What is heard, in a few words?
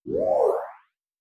Fly By Whooho